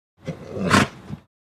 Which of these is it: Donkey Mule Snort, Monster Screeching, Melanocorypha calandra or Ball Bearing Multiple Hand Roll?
Donkey Mule Snort